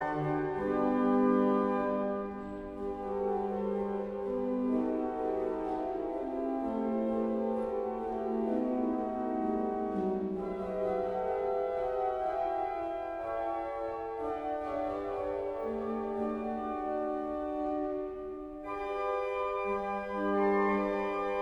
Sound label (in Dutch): orgel